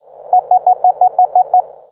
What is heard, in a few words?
error spaced